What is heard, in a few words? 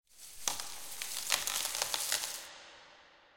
free Spin Start; netent; bloodsuckers2